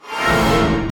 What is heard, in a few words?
shadow magic; final fantasy